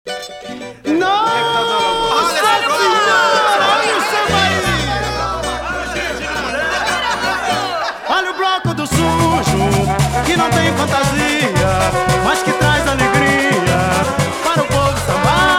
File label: samba; Sambas